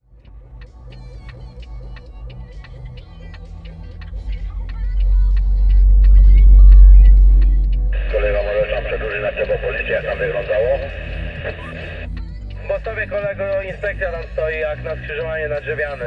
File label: cb radio; miastodzwiekow